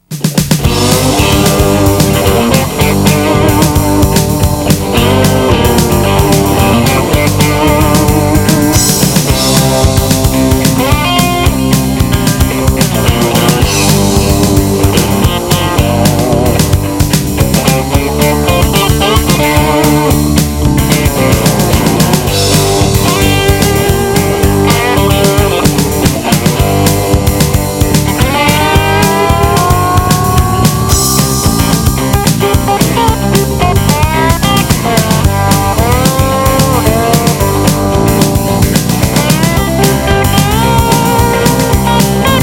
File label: Southern rock mid up tempo driving positive confident